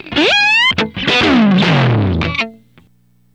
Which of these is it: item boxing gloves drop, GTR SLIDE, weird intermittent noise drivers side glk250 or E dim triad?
GTR SLIDE